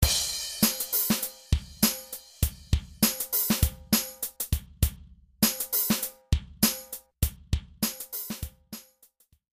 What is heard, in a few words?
drums